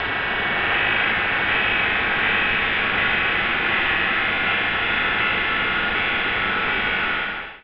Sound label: SPACE-FX